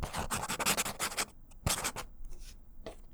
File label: Fine Felt Tip Scribbles; bb - Books, Paper, Writing